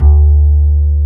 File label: BS UPRIT B, Jazz Bass Acoustic Bs; Roland LCDP02 Guitar and Bass